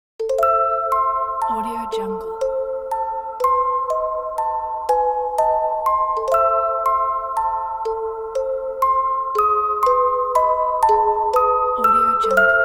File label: spooky halloween magic box